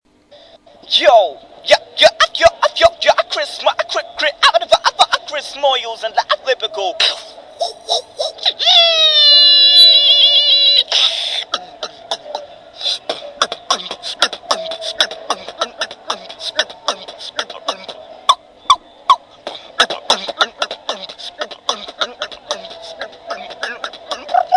beatboxer

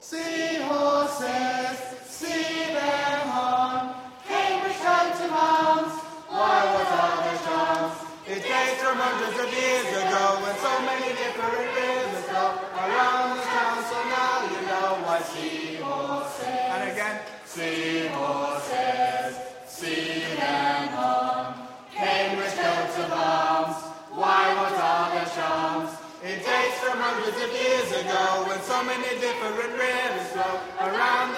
singing; singing history concert 2016

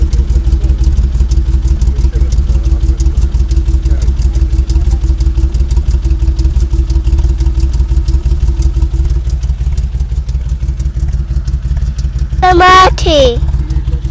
maserati tick over

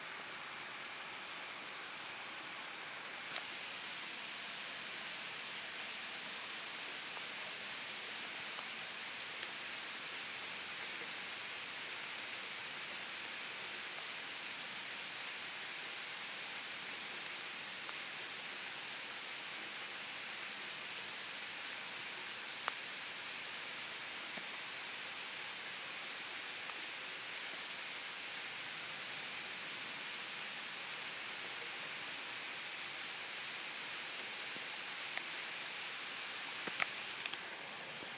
rain

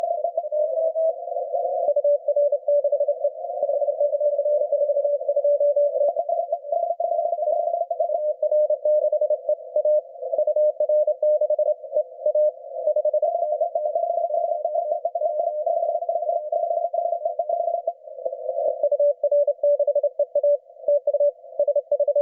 20m cw; wpx

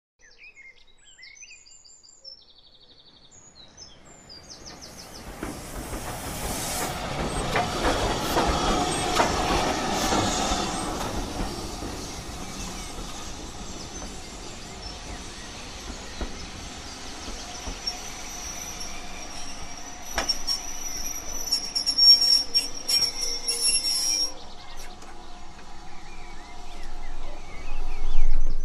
the watercress line trains steam; hampshire

